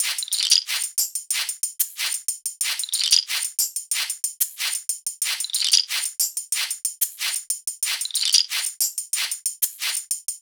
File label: hat loop 92, Drums; Pop; Hat Loops; Slate; SD PASADENA Pop Sample Pack